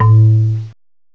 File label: BASS MAR, MALLETS; M8; CMI Factory